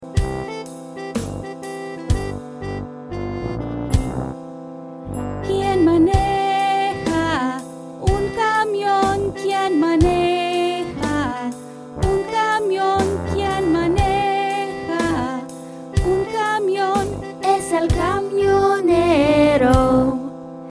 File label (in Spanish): quien es spanish